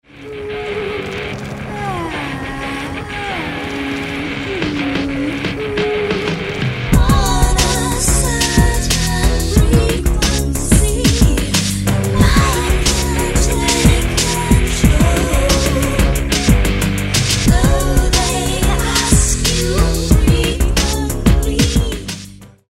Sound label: distort